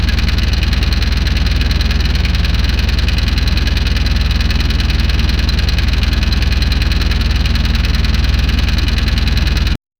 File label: heavy diesel generator id, low rpm